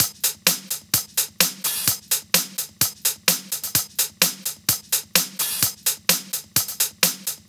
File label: 128BPM Drums; Vengeance Samples; Query Kit